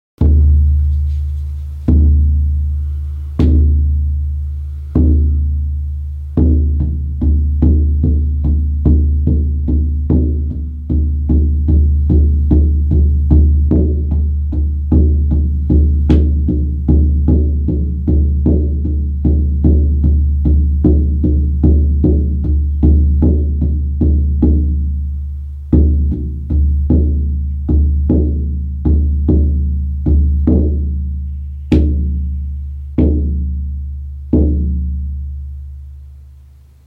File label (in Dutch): geit, sjamanendrums